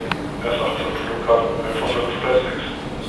Geräusch

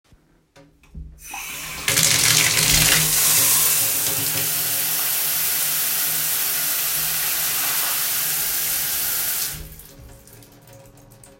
Dusche

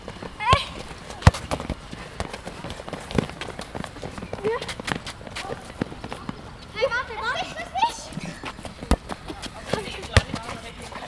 Geräusch

